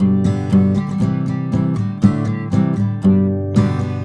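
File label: guitar, 120, Celtic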